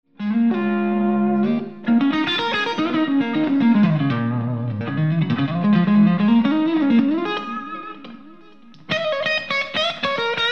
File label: SD1 Clean Boost